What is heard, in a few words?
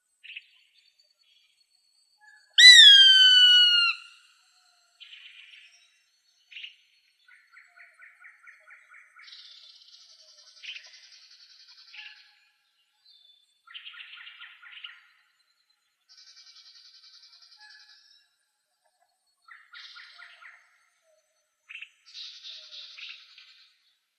Birds of Prey; GRHA